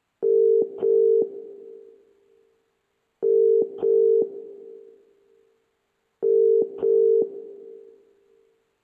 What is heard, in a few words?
phone call; seeding-planets